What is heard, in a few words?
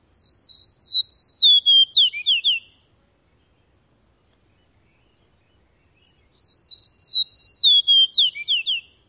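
songs red subspecies, Fox Sparrow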